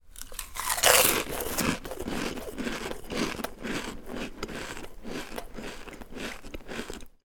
human eating potato chip stackable; pringles

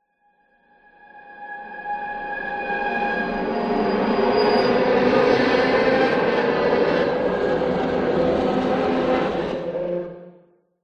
horror metal scrape processed evil scray

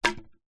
wood stick thick med heavy drop concrete; foley

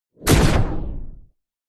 science fiction weapon blaster gun shoot whoosh

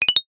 multimedia ui notification alert short glassy

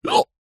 human male hiccup single

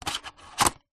toy stereoscope plastic disc load

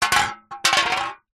food drink can soda empty knock over wood floor

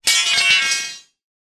impact metal bar thin long large; zapsplat

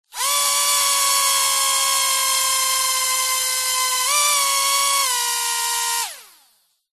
drone micro motor whirr burst movement; zapsplat

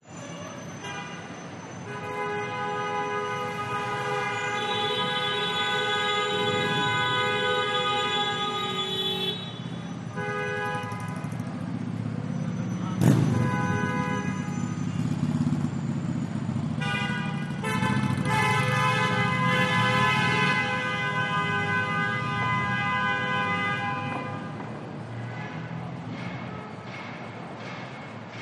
New York City Traffic Rush Hour & Cars Honking

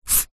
leisure playing card slide on table; zapsplat

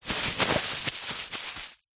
foley plastic bag thin scrunch up

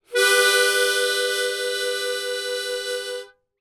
musical harmonica single

